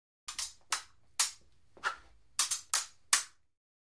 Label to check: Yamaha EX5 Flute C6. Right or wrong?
Wrong